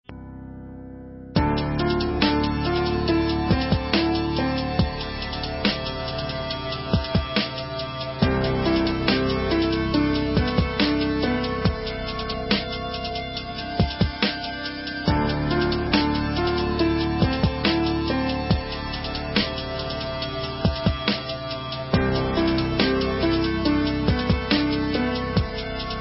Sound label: Sad Love